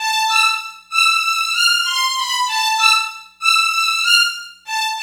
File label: Strings